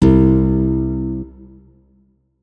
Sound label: notes, guitar; Hideout Furniture